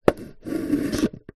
straw slide in plastic lid fast food

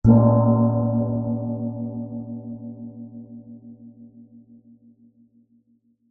musical instrument gong small gamelan hit soft

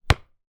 sport rugby ball bounce hard ground

